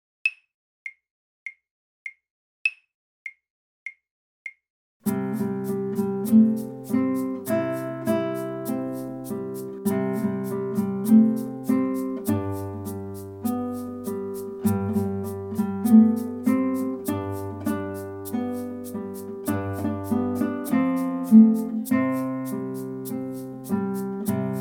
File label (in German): Tom Dooley, Gitarrenschule; Gitarrenschule Band 2